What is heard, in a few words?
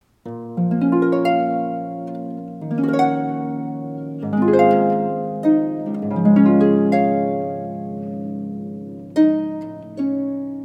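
sarabande, Pedal Harp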